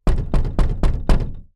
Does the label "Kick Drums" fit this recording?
No